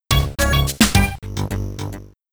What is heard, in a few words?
Grassy Plains Jingle; Whizz; Amiga